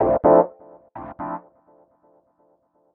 126 D MUSIC; funky; Loopmasters; Funky Underground Vol 2

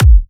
Bassdrum

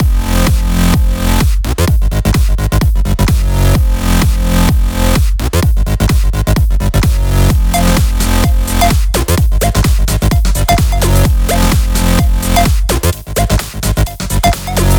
Electro House Mix; Mutekki Media; Vengeance Electroshock Vol.2

